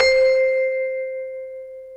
N Bell C5

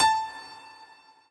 piano

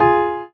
Drop Lead; osu

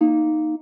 Harp Bb E Longer

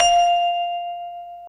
N Bell F5

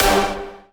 punch G5

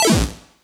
combo break

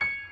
piano high

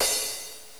cymbal

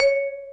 Bell C#5; osu

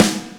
soft hit clap; osu

